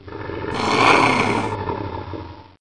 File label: gonome idle; mystic radar; mr zombie1